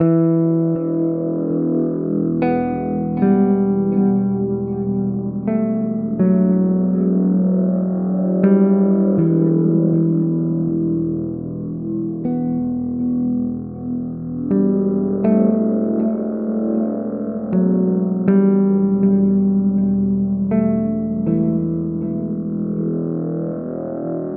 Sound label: Unknown Darkness Loop